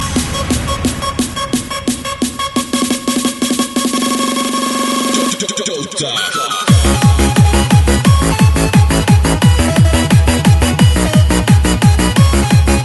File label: techno; Dota